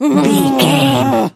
voice female growl

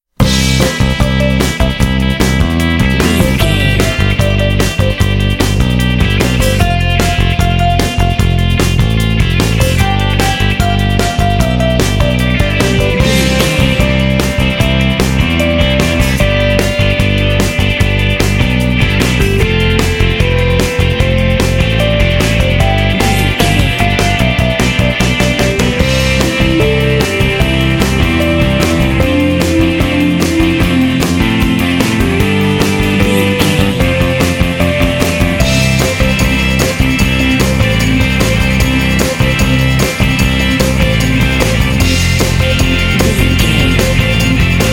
groovy

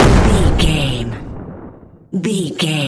explosion large

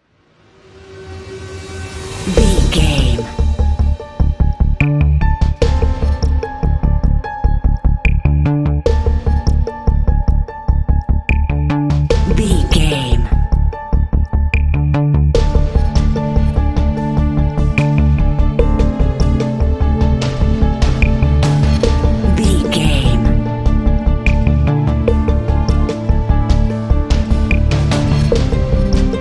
fear and tension

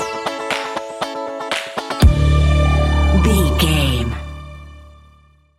A fast paced piece of electronic dance music; perfect for the nightclub, and a youth orientated visual scen

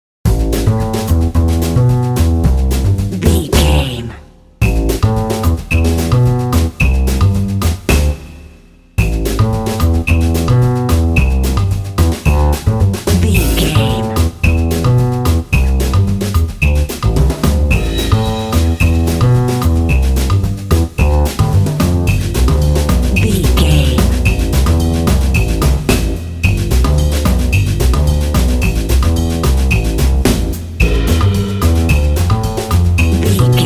playful jazzy dramedy

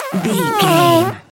fart squirt

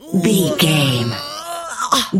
voice female effort push

